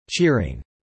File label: cheering